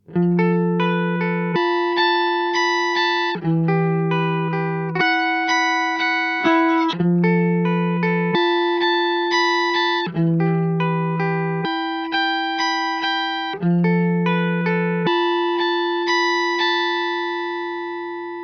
EHX Pico Pitch Fork Octave up

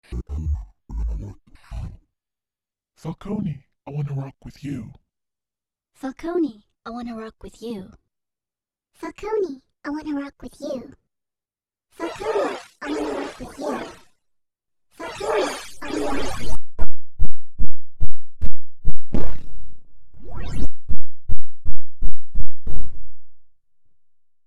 Pitch

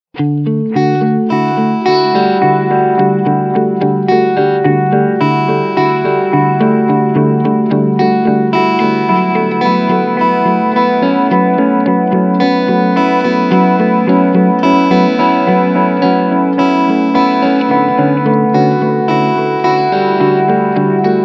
Analog Echo

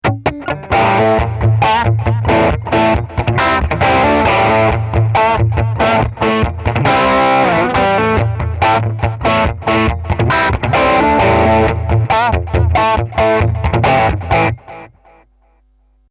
Crunch Bridge

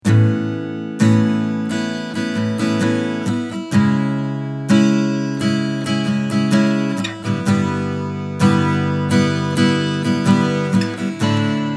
chords

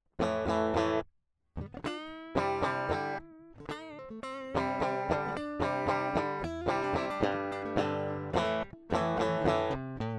DI JCM 900 Tele Lead

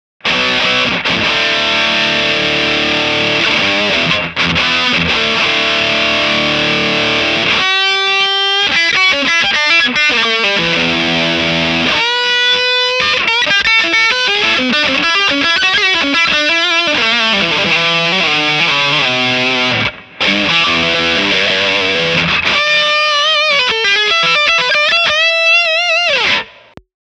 modern full gain boost 13h

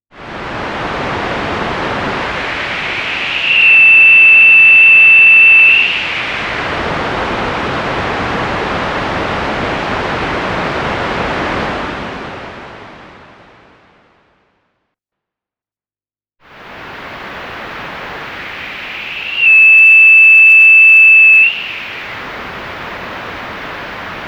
Noise Filt In Noise Filt Out Bandpass

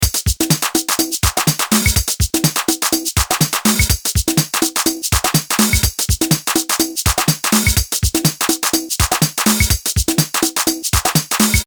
MFB Test Shuffles

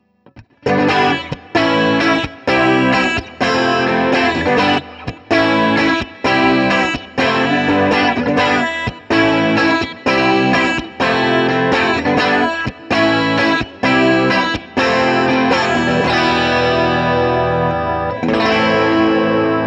Gretsch ETMC LTD Pristine JET Bridge Pickup

